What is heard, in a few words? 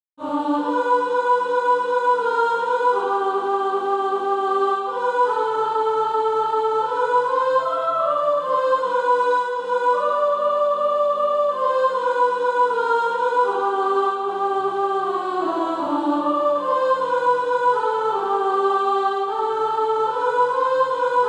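soprano, Practise Music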